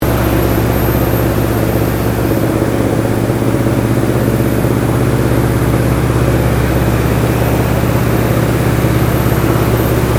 Aircraft AT 6D Harvard Mk III flying decel interior, American military training aircraft; Airplanes